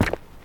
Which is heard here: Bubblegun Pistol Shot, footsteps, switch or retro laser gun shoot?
footsteps